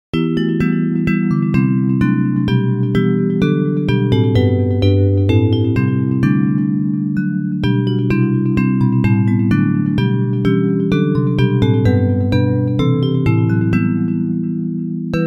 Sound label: Bells, Traditional hymn